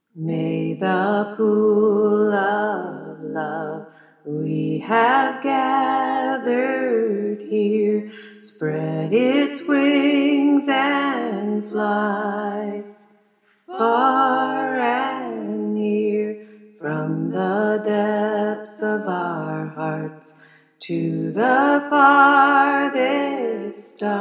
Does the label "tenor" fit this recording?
Yes